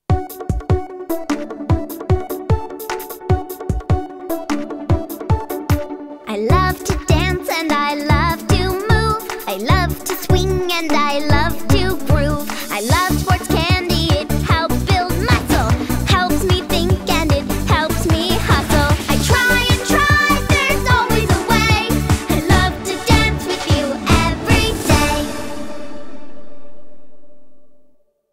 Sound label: Piper song